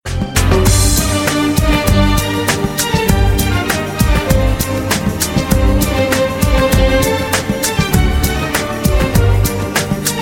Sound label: mozart symphony no 40 ringtone